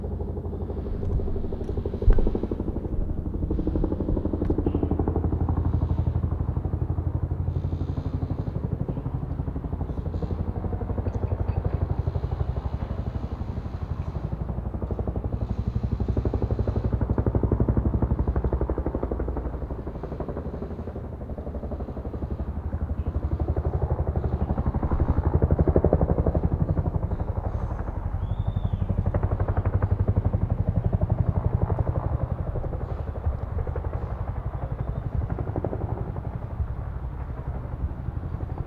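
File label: Helicopter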